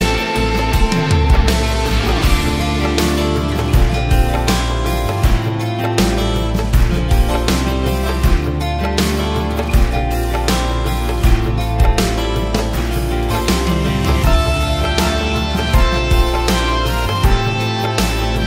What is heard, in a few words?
Instrumental